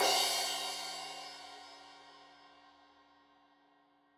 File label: Crash 15in L edge, Cymbals Stereo